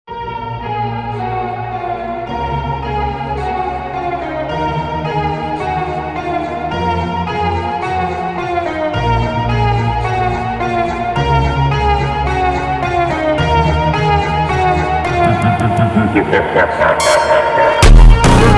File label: Funk slowed Reverb